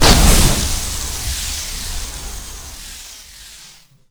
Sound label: goob sub explo; Quake 4 Alpha; id software; weapons; goobgun